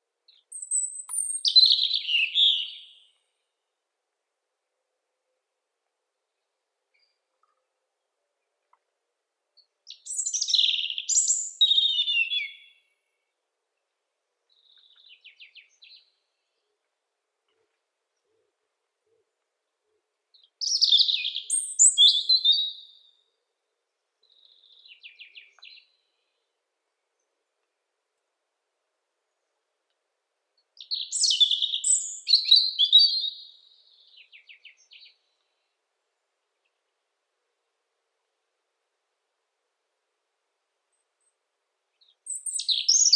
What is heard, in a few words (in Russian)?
zaryanka malinovka erithacus rubecula